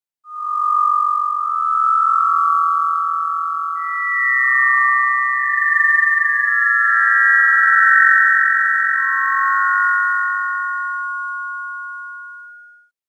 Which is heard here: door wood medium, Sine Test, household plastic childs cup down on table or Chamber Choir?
Sine Test